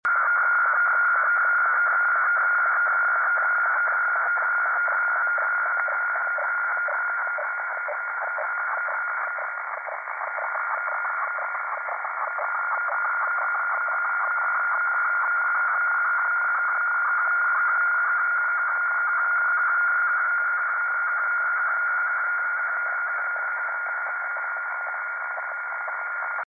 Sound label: fax